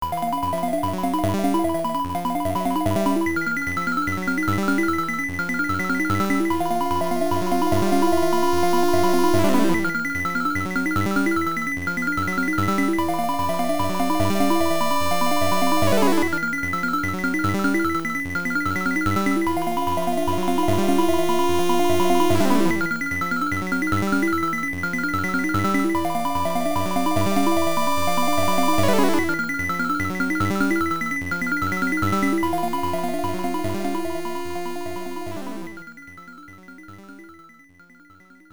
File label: Time Out Warning; Daimakaimura; PC Engine SuperGrafx